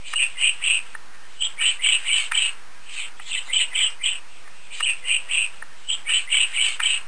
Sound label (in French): pic